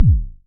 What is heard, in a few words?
modular pitched, Kickbank; Ableton Foundation FreePacks